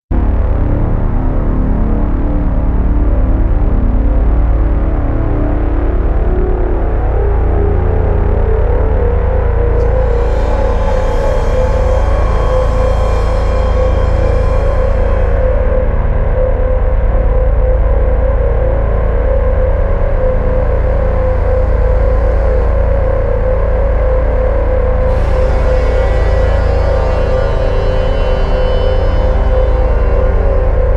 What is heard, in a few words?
Drone; Production Music; CATastrophic